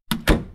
Door Close; Angry Neighbor; android

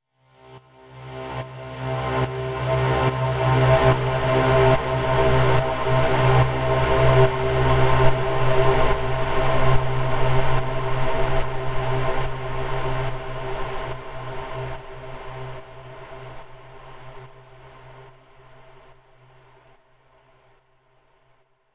Stinger Exploration Drone Pulse

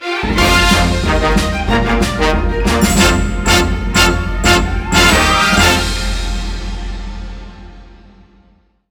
Award Sting; ABC Wipeout 3; Wii U

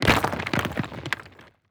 masonry break; teardown; ps5 windows xbox series xs

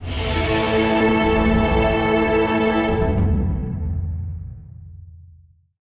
level up

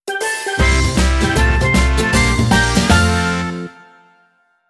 Minigame Balloon; Taiko no Tatsujin Portable 2; PSP

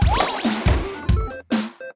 Sfx Ui Arcade Enter

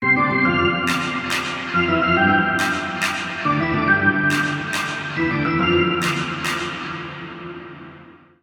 Music Stadium

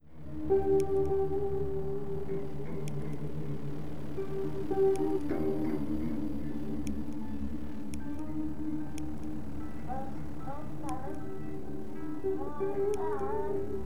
Red Room Jazz Loop; Face It - A Game to Fight Inner Demons

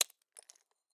ice hit

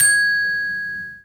Note Grab Success; The Princess and the Frog; wii windows

